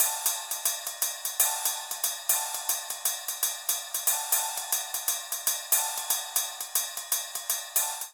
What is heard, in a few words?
Descarga B Finger Cymbal 118bpm, Latin Percussion; Ableton; Factory Packs